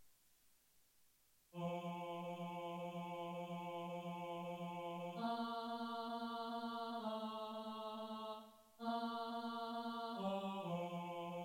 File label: tenor, choir